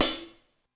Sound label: Open Hats